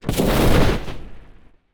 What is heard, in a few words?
Fire Magic Flame Thrower Start; Cielonos